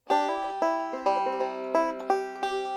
pick; neck wood

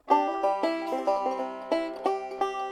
pick; neck wood

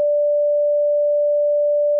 pure D5

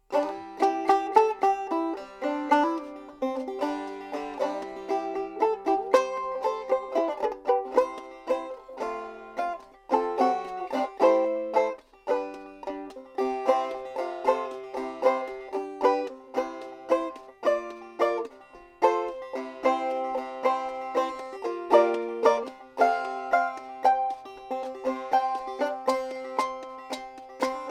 frail; neck wood

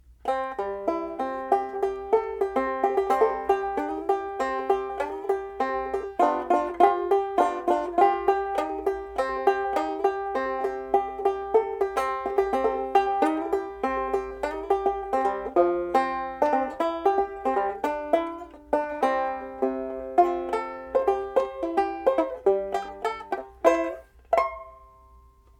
nylon

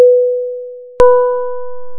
sine stiff head pluck

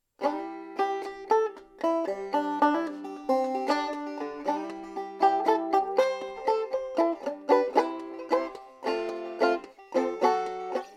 C frail; neck wood

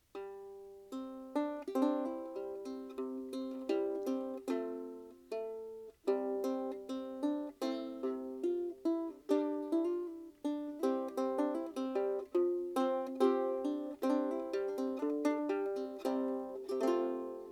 hexagon nylon

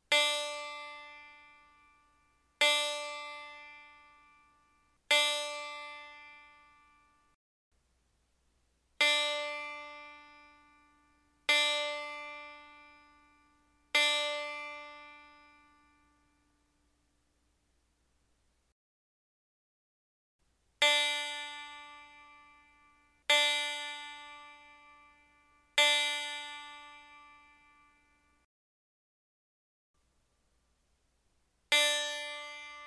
3pluck samples

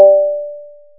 FM pluck

